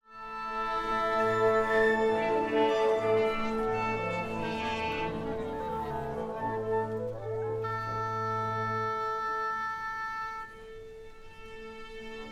Orchestra Tuning Ambience - Long Symphonic Various, Light Speech; Hollywood Edge; sound designer's toolkit v2